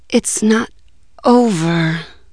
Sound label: VX DIE; REDNECK; RAMPAGER